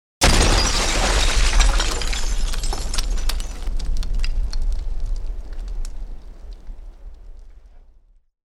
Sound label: Glass Explosion